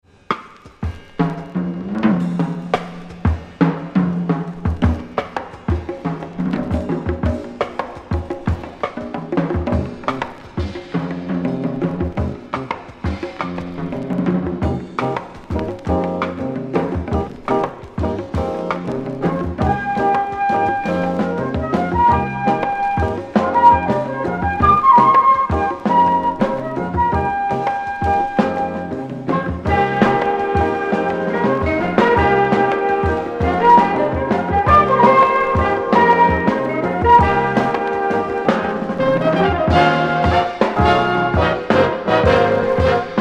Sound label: Drum dream